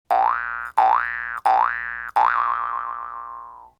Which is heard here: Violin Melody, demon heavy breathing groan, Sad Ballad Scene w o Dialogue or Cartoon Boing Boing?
Cartoon Boing Boing